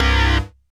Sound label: SYN TEKNO; Sound & Vision - Gigapack II